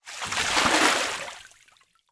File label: Liquid Water Water Wave Splash Single